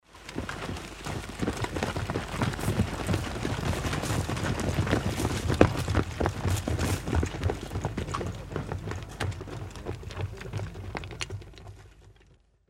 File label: Soldiers; Age of Empires III; cinematics